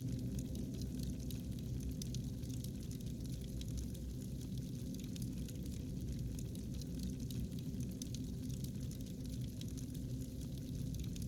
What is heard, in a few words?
AMB; BLIND QUEST - The Enchanted Castle